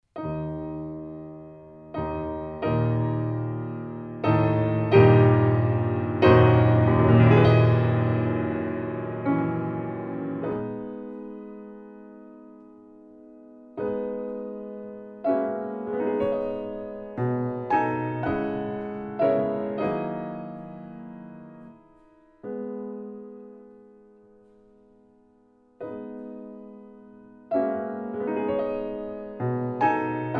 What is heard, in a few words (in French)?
Choral Sol m. Lent et soutenu